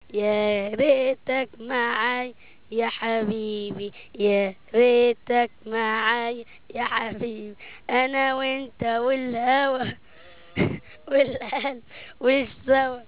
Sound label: children